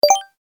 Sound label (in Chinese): 技能面板点击选中音效